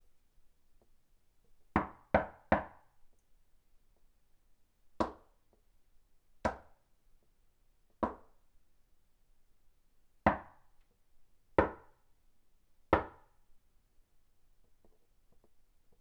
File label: Knock